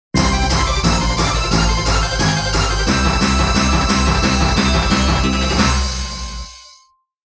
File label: Victory Fanfare; soldam kaika sengen original soundtrack 2017; VS Mode End